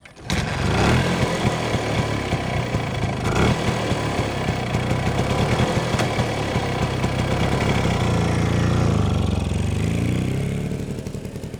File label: Rajdoot, motorcycle, kick, start, rev, away, slow, Yamaha Rajdoot 175cc; Bombay Sonic